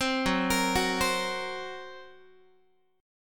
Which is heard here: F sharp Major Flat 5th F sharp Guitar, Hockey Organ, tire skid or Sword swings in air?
F sharp Major Flat 5th F sharp Guitar